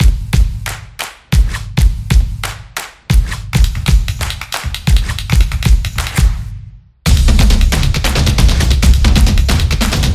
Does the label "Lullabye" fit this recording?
No